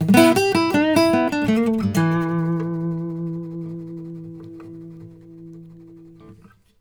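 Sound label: baritone lick E, Blues Guitar; ILIO; Fretworks; 108 BARI LICK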